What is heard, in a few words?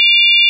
sine; ものづくり基礎工学（情報工学分野）; 音の波形と分析